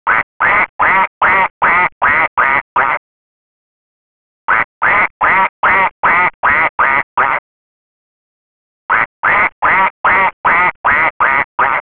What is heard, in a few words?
Duck